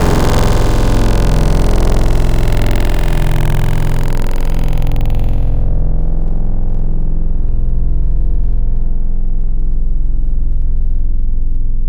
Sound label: Futuristic, Tropical Mallets; Synthetic's Serum Collection; SparkPackers